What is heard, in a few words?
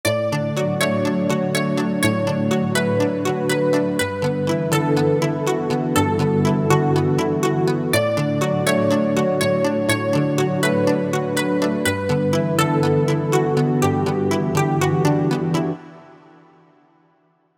Clavipad; vintage synth roland srx keyboards